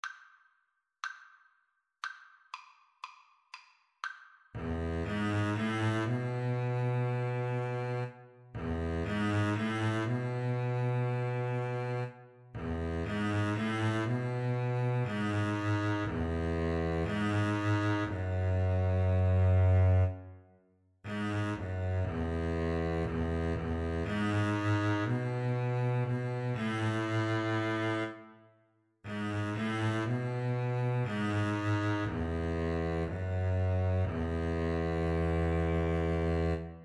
Double Bass Duet

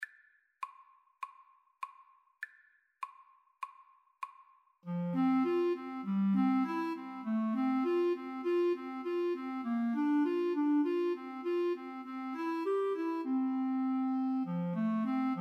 clarinet horn, Clarinet-French Horn Duet

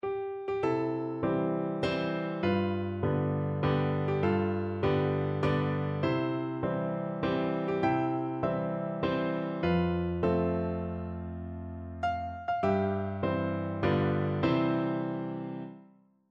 piano, piano duet

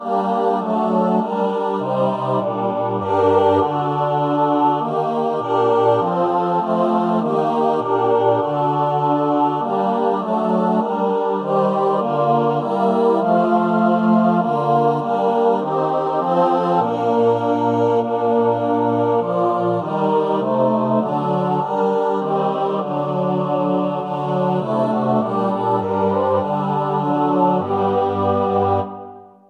choir

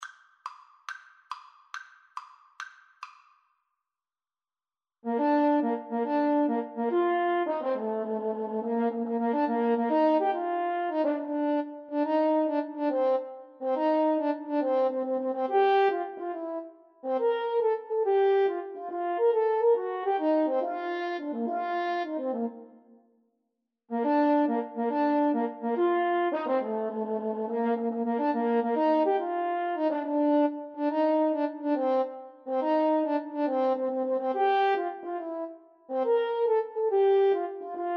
French Horn Duet; mendelssohn italian

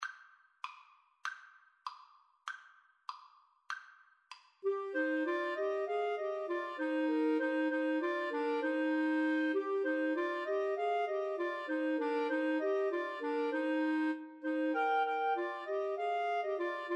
Clarinet Trio

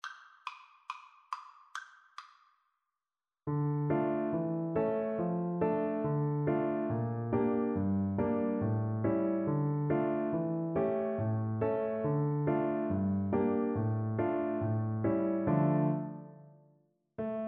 lully gavotte FL kar, Flute

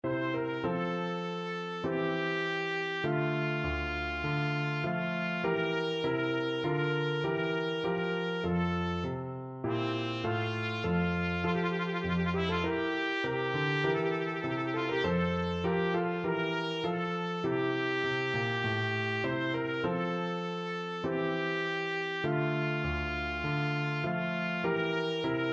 bourree, trumpet; handel

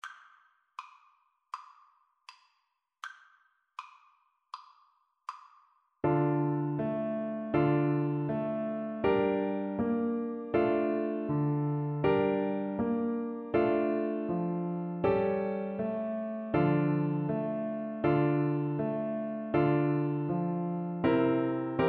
Israeli National Anthem, Cello; Hatikvah

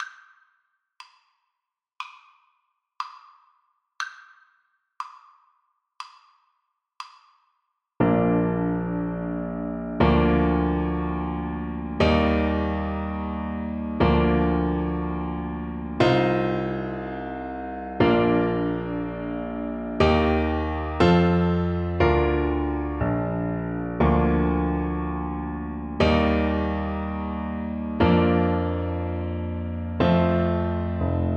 twinkle twinkle, Trombone

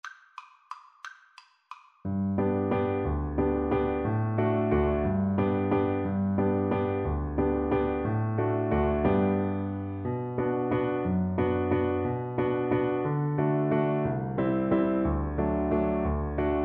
Tin Whistle

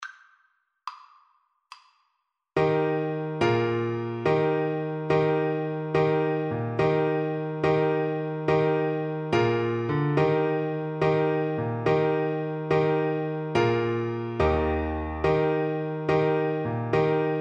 jig, Clarinet

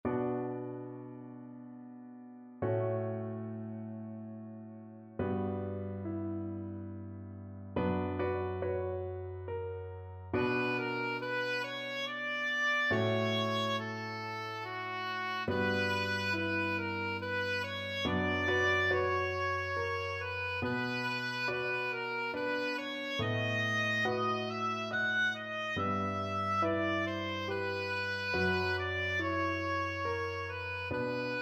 vitali chaconne, oboe

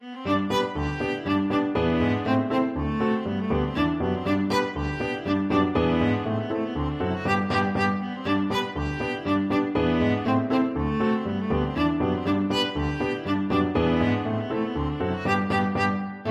viola

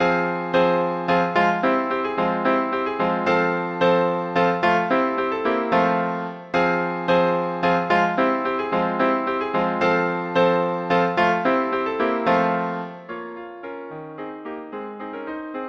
dvorak slavonic, saxophone quartet

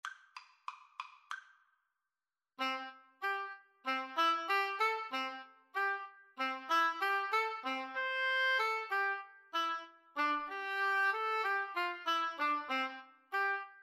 when the saints, Oboe Duet

